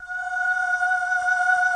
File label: voxy wind; Greytsounds; F2-SYNCLAV 2